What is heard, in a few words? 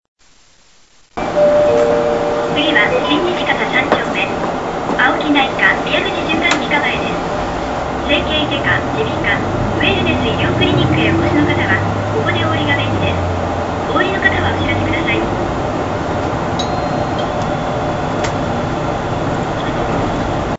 bus tape orgel; mie; sanco